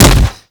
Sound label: Impact Flesh, Weapons; Warframe; Furax; Impacts